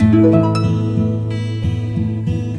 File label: guitar